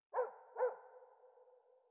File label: ambient; World of Tanks